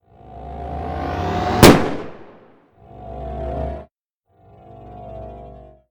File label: blaster fail; Apotheorasis